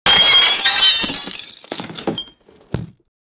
breaking window, breaking glass

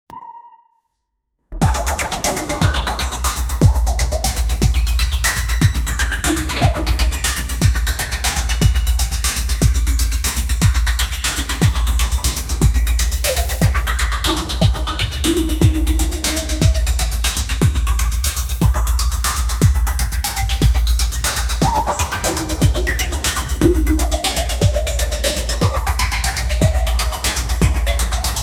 futuristic upbeat cave gr, groove

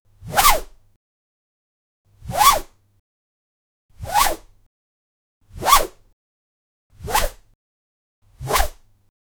knife woosh swing, sheath; spirit

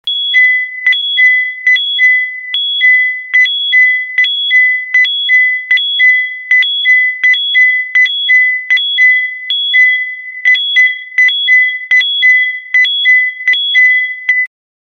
car alarm

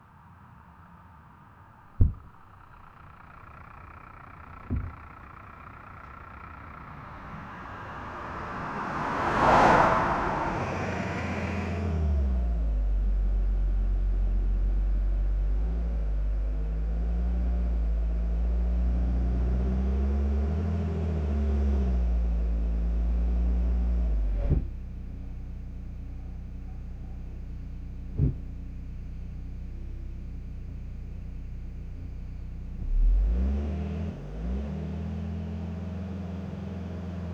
car sound, vehicles; tata nexon

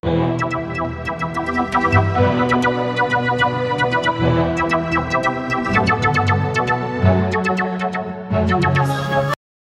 treasure adventure sound

